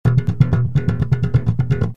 Bass Slap E 127

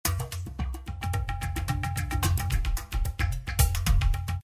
Tabla 110; Tabla Guys

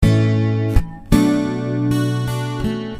Punchy A,Bm 80

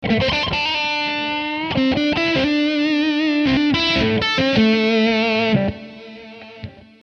Am2 80-100 solo blues

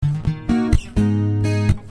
Punchy Ebm, Abm 66

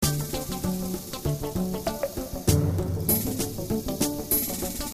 Funny Funk 98 D7

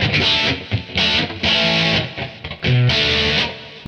122 rhythm

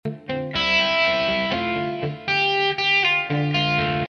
Dm 120 lead

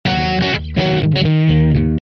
124 rhythm RnB

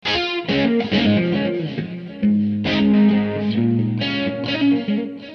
Ab 080-100 solo

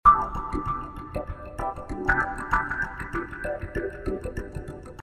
Swinglefunk C

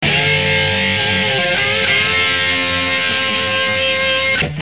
129 lead

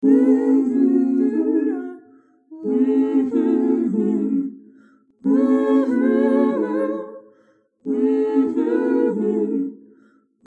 92 Soft Soulfull Harmony

